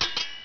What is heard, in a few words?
sword